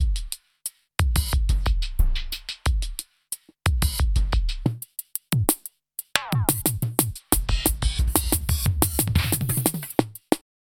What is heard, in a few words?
drum; Cubase Magazine 46; Evolution 08